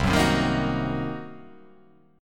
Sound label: C Minor 7th Flat 5th, chord